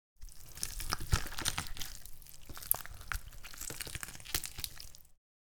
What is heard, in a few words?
Chickens eating flesh